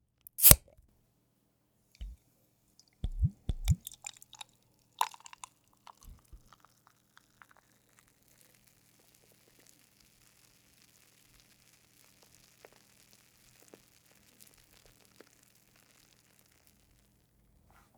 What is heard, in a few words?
Beer Bottle Open Sizzle